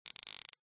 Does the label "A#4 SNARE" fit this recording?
No